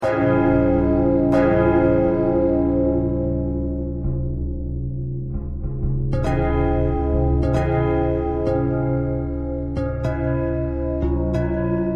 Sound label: bell bell thread pentatonic, bells